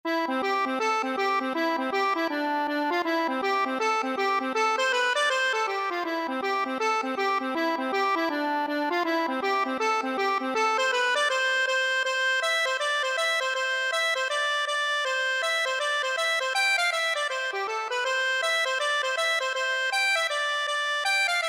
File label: accordion